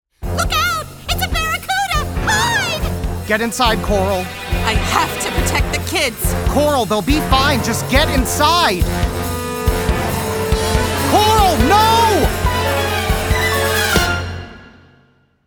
Barracuda Attack; finding nemo

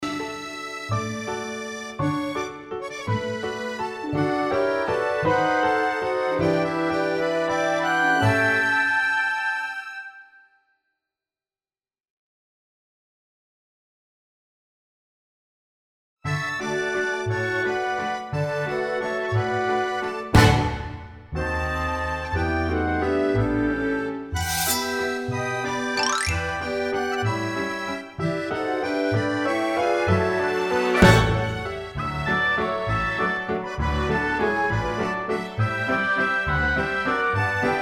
Instrumental

